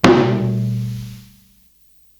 Rack Tom, Psychedelic Drums

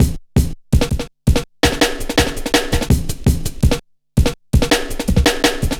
Cool, Drum Loops, 165.5, Jungle

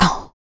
HIT, Jazz FX FEM PERC

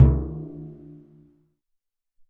Surdo

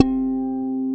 GUI HRMFLA, Acoustic, Roland

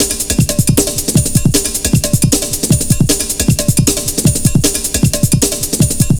155, breaks, Loops

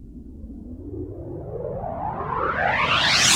Juno Noise

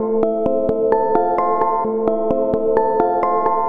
Arpeggio Line, Synths

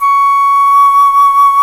FLT C FLT, Flutes, Flt Brt; Roland LCDP04 Orchestral Winds; 90 s Sample CDs; C Flutes 3-8

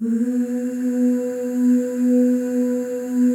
OOSC, FEM OOS, Voices; Symphony of Voices; Spectrasonics; FEM.2 OOS

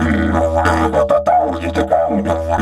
Didge 93 BPM, Didgeridoo

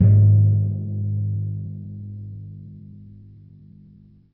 G#1 p, Timpani, piano; PowerFX; Downtown Orchestra; scales

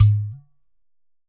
GHATAM

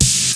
SLAP ANA S L, DANCE KIT; Crypton - DrumBase5000; DANCE KIT #3

